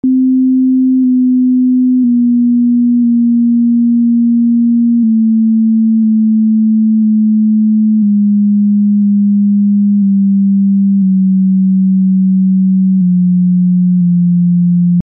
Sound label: Alti+Tenori+Basso range 3 mixed descendant scales 157+138+126 cents